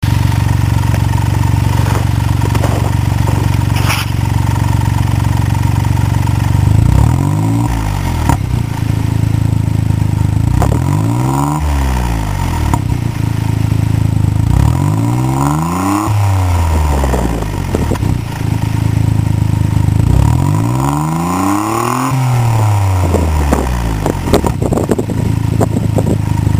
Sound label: muffler